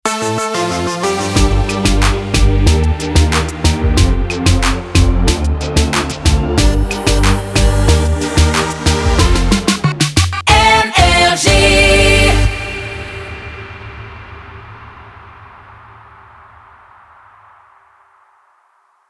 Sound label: Jingle Ramp; NRJ